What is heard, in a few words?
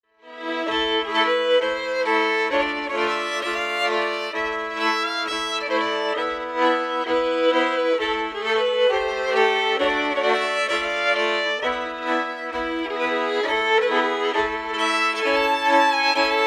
carols schottis